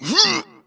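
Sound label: effort light, voice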